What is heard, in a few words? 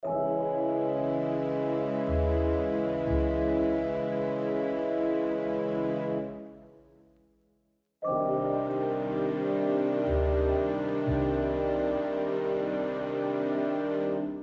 Music Loop 32 Bars No Harp; Agent A: A Puzzle in Disguise; Windows